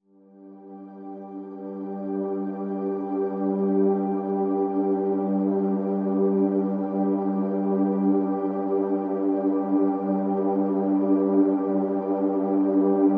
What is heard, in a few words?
Pads (G)